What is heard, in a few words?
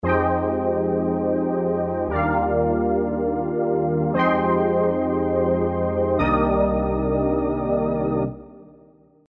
Lo Fire; Serum